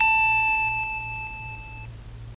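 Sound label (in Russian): игрушечное пианино настроено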